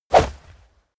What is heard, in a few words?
comic swoosh fast; swooshes-swishes